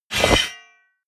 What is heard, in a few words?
metal blade large pickup